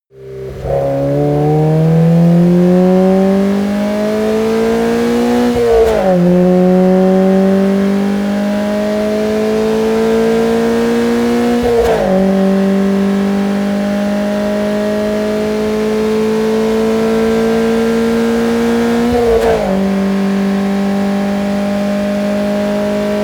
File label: car toyota gt86 acceleration